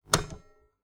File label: Typewriter